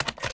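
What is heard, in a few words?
sniper reload fast mag in; gmod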